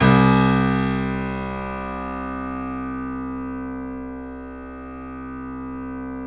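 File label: piano, C, left; InVision Interactive - The Piano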